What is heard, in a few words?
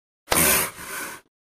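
Alligator Breath Short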